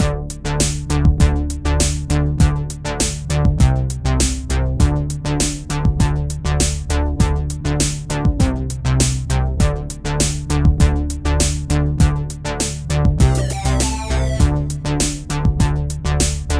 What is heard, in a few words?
Fireworks Music Main Menu; ps vita